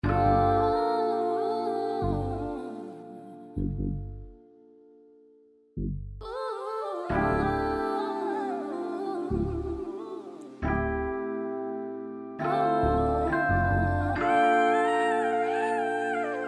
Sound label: Angelical 136 D minor R B